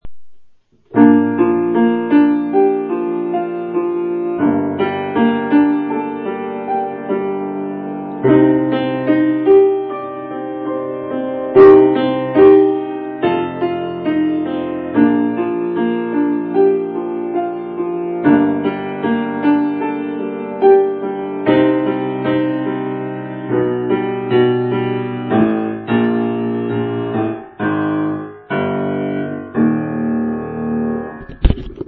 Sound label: Steinway, piano